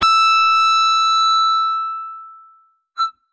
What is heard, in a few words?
guitar electronic, distortion guitar